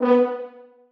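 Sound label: horns staccato a#3; Sonatina Symphonic Orchestra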